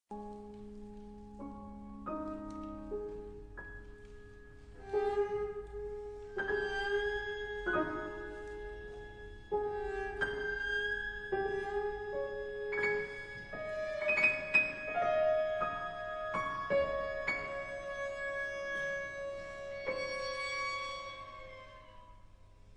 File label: Sheng